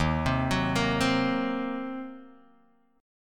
Eb Minor 6th, Guitar Open D

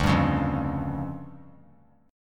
D Minor Major 7th Double Flat 5th, D; DmM7bb5; strummed; JGuitar

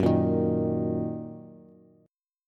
Gb 6th Gb down Bass; Gb6 strummed; JGuitar

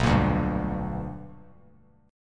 B Minor Major 7th Sharp 5th Bass Standard

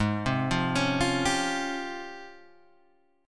C sharp Major Sharp 11th G sharp

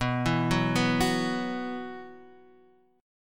B Suspended 4th, Guitar, Half Step Down

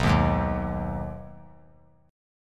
B 6th B down 3C 3F 3A 3D, chord; strummed

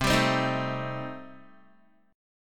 C Minor 6th Guitar, chord; Cm6 strummed; 8,10,10,8,9,x

